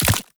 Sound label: Oraxia Brood Spider Land; Warframe